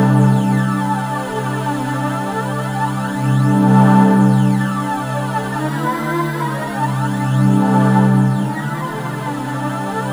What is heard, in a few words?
C3, Synth Leads; 90 s Sample CDs; NiceBeats (NiceBytes); Studioline 09